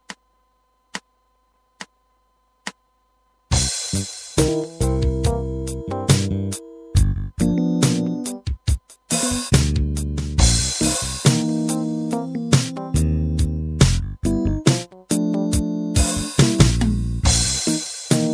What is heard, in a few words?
Rabbit Backing Track